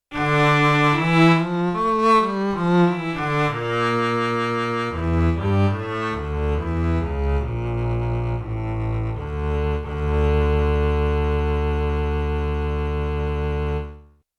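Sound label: Contrabass & Cello Unison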